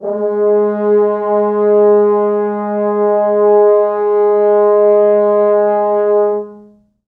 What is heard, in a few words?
4 FrHorns